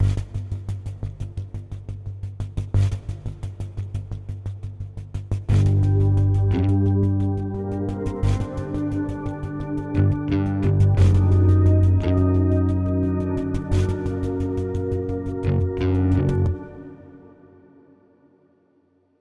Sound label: prefinalboss bgm head 87 5bpm Gmin 24beats